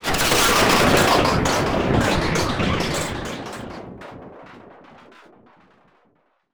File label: futuristic electronic engine fail